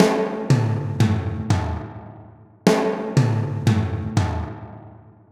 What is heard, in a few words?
Power Drum Set Fill, processed; Sonic Foundry (Sony Creative Software)